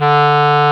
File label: CLARINET D2